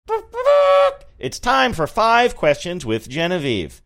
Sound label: making trumpet sound; saying its time for 5 questions with